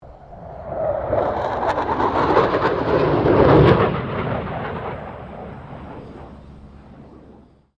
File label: AIRCRAFT FA-18 HORNET PASS